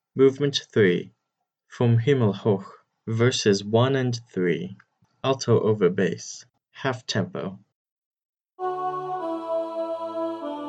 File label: alto over bass half tempo